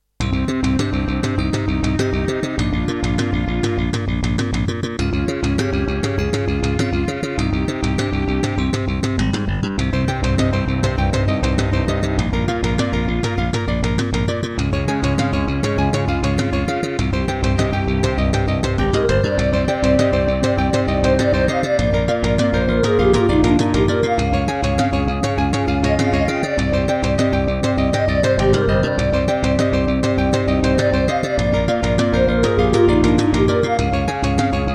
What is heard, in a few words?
Roland PMA-5; Hocus Pocus